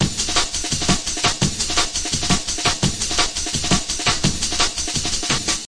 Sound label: 169 mellow clanging roller, drum loops and breaks; 161 to 180 bpm